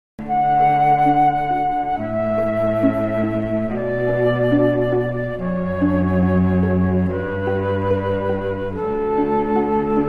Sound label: canon, trio